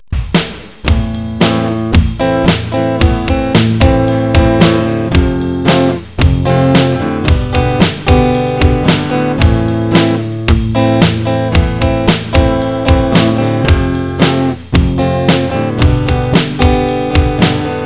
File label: amhalf, scales